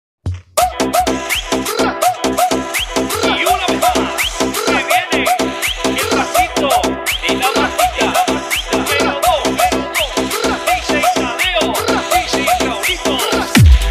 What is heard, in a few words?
Dj Remix ringtone; Free Fire